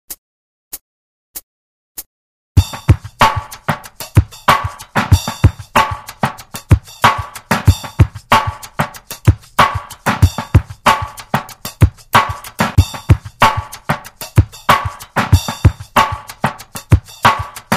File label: drums